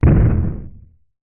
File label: fantasy giant single footstep distant heavy wood; zapsplat